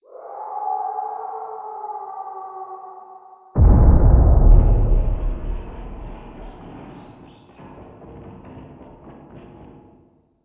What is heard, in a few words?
distant fighting